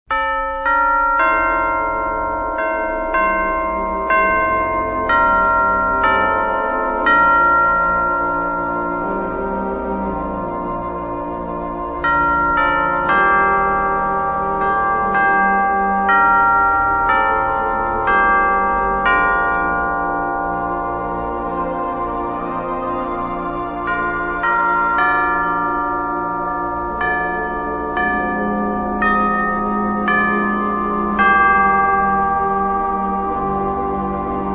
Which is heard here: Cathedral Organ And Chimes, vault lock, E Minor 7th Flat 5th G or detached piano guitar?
Cathedral Organ And Chimes